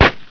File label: CRACKER, 爆炸